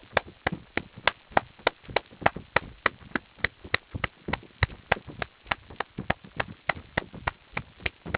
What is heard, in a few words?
percussion